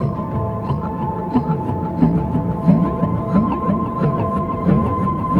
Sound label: Ambi Groove; Sonic Foundry (Sony Creative Software); Ambient Grooves; Ambi-Grooves